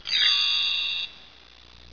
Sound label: BELL TREE; MUSIC; INSTRMNT